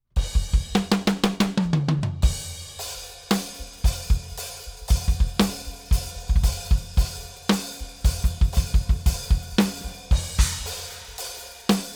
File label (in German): metal klangbeispiel; Presonus DM-7